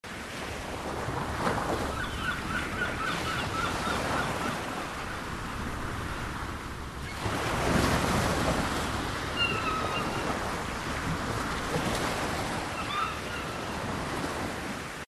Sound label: Ambient Ocean